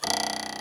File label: twang